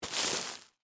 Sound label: Foley, Plastic, Bag, Rocks, Pick, Up; Pebbles; Rock Sound Effects